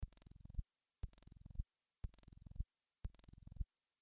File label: alarm